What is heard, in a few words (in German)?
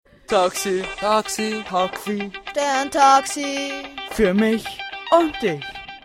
Jingle Taxi; stern Taxi; Hünenberg